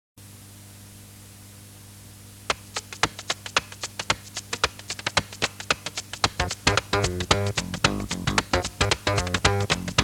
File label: without drums